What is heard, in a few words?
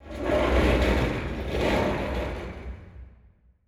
hangar layer; War Thunder